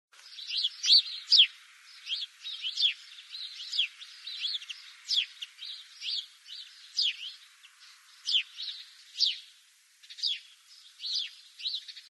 Grspurv

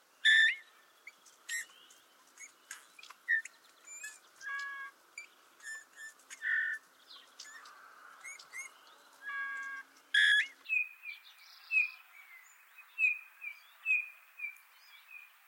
DOMPAP sang call

